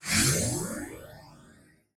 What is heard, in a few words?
sci-fi sfx